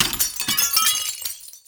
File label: glass break